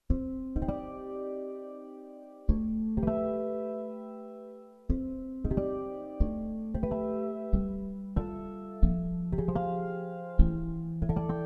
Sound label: guitar